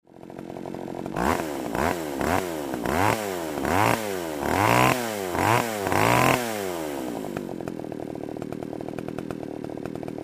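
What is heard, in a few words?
chainsaw revving; benzopily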